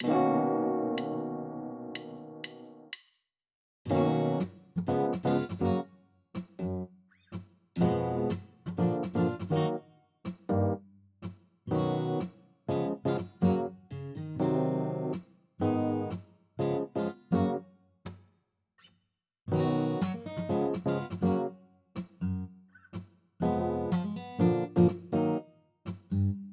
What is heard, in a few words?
inst Bpm123